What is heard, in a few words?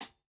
hihat, house